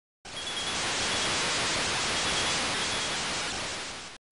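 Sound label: sounds for notifications on phone in 8-bit style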